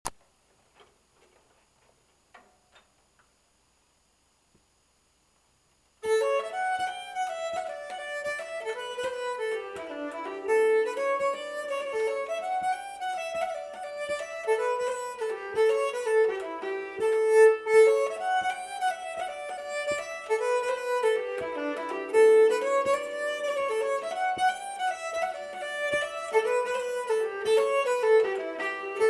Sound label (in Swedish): Engelska